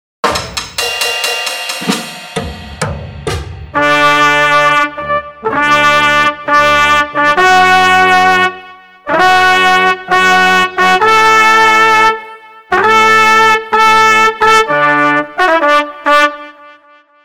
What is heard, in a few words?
Fanfare; Mozart; besondere Fanfaren